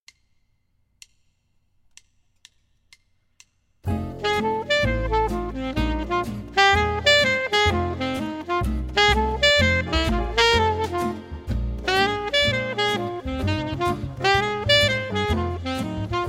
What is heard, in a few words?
alto sax f blues